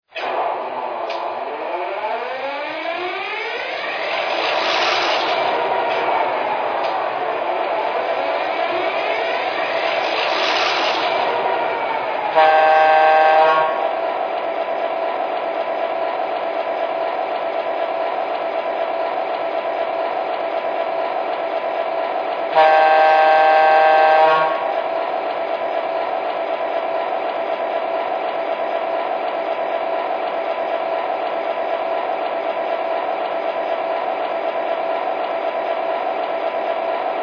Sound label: e6, trains